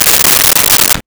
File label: Paper Rustle; Office Supplies